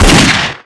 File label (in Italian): sparo, armi